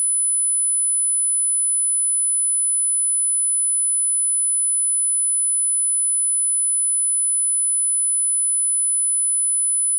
10000 khz

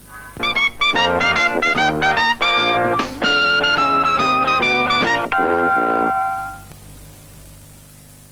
trumpet outro; loeki

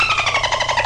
dolphin censor

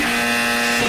lie detector bassboosted; meme

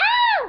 scream scratch

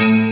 windows 7 error sound

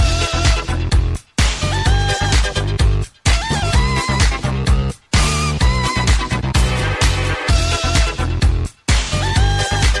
instant dance party

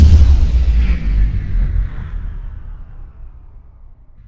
generator power down

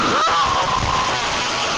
loud and scary scream

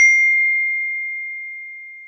notification; iphone 13

